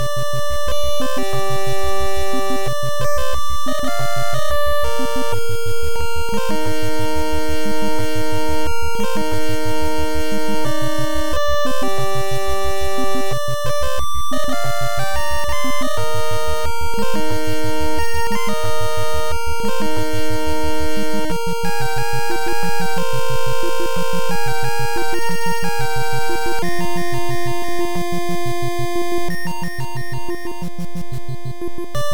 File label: (fast, unused); The Panda Prince; NES